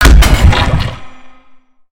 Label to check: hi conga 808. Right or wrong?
Wrong